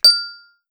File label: bell Sound